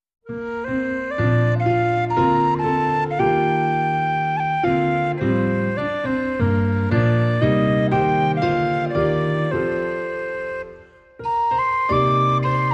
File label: Hymn